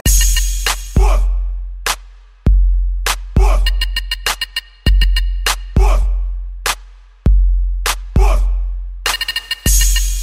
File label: A Pella; Top40